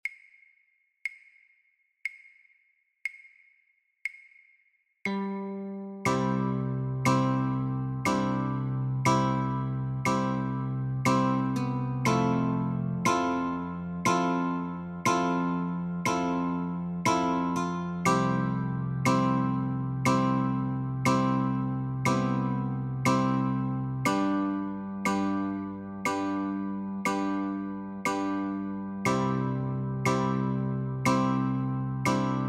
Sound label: Notes and Chords, guitar